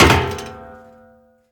Metal Jump

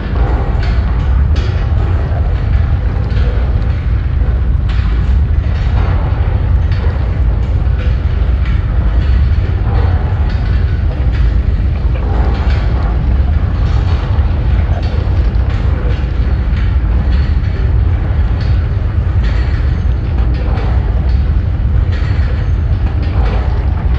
Grinder Wheels Loop

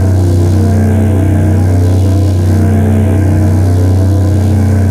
Drone Loop

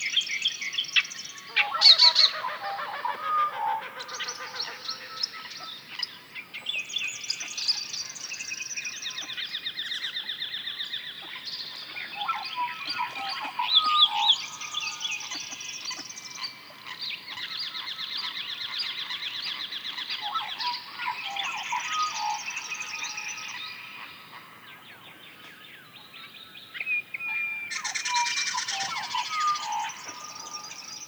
Ambient; Kalamee

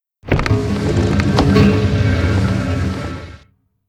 Hydrant Sheeth Down

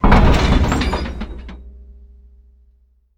Floor Up Button up

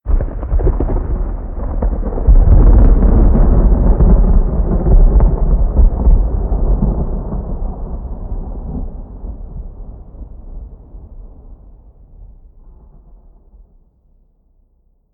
Thunder; moul

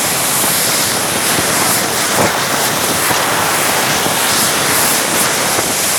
Luminous Urn Loop

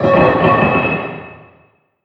Random Metal Drops; moul

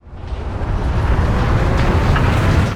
Upper Elevator Start

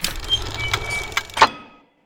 Ring Puzzle

